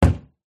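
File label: Cabinet Door Shut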